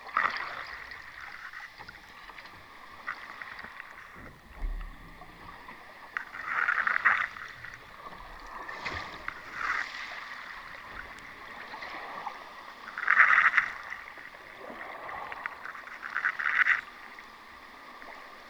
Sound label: hydrophone hull Scrapes; whale Watch